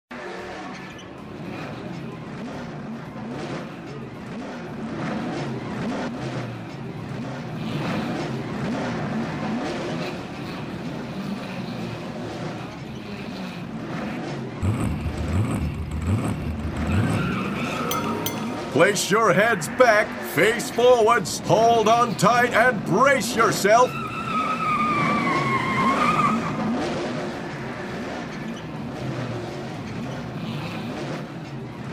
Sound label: Station Loop; Stealth